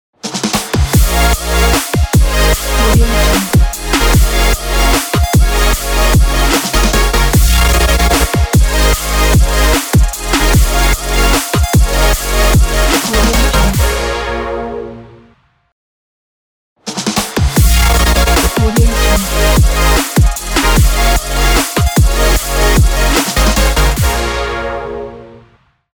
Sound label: dubstep logo